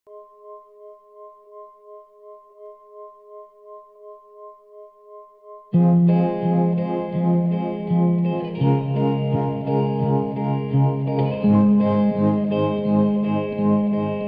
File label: instrumental